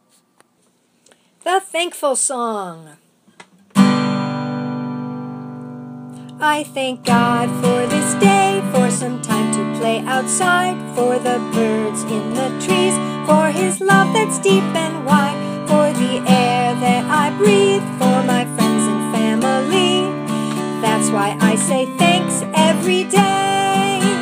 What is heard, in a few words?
Thankful Song